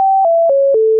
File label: beep